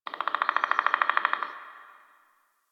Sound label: Forest Bird Mid; Warframe; Grineer Forest